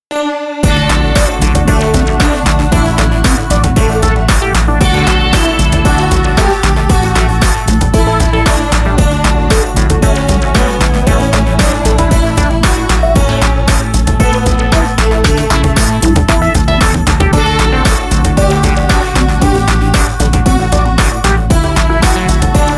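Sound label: Oh Come All Ye Faithful, 115 bpm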